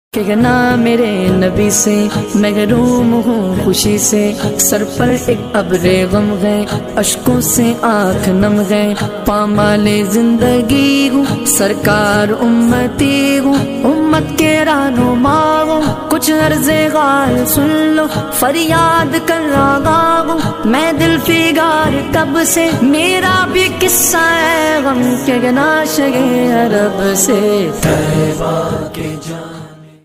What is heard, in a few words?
islamic ringtone